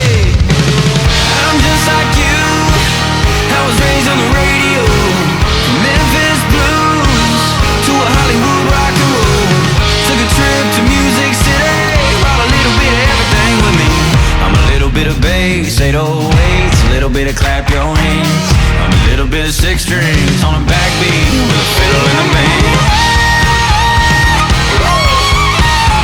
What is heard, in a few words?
Fiddle